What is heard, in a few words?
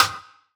Rim, DRUM SOUNDS; ORIGINAL JUNGLE BREAKS